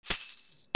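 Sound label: percussion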